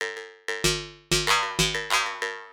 808 Loop SD 95, Roland 808 Drum Loops, 95bpm

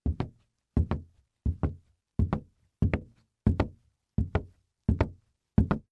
Footfalls Dry Boots On Wood; footsteps

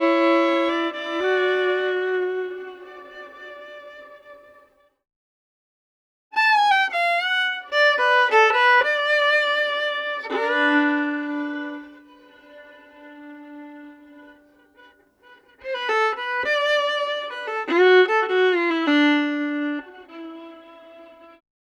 Fiddle

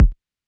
808 BD short, Roland-808 Drum Hits Bass Drum

